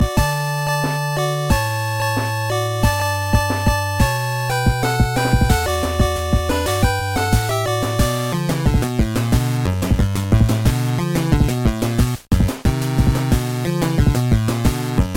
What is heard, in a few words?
8 Bit